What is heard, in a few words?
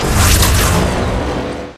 torpedo; World Of Warships; weapons